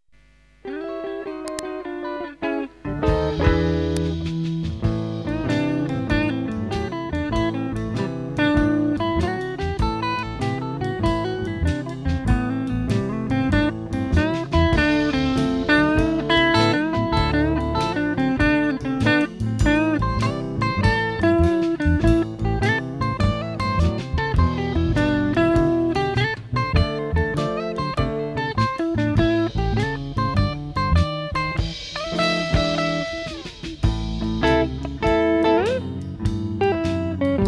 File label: Blues Intermezzo